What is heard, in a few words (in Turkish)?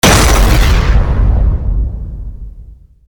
bomba; quake